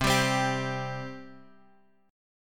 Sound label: C 5th C; C5 Chord {X 3 X 0 1 3} strummed